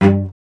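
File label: STR VC G, strings cello; Miroslav Vitous; Solo Instruments VOL-1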